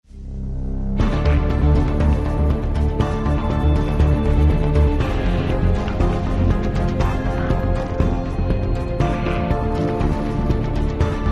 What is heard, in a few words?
Loop Tense